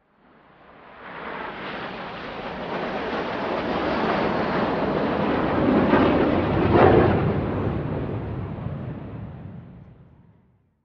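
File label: Plane Red Arrows overhead Far J; hawk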